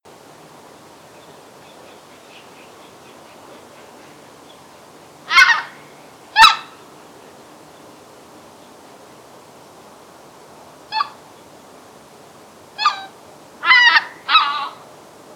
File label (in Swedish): Bläsgås